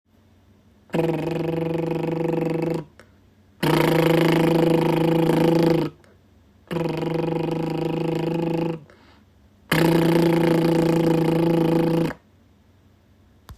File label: strong and weak with lip roll